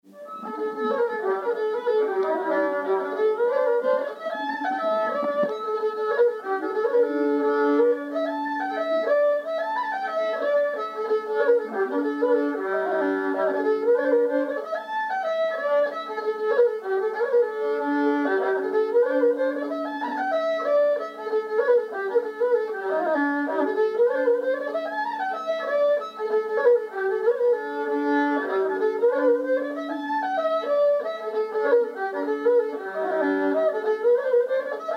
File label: Hornpipe; Mc Dermotts hornpipe